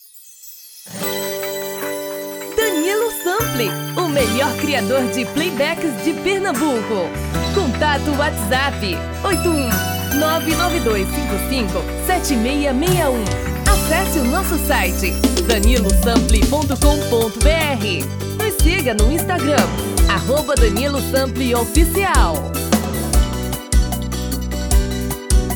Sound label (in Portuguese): Brega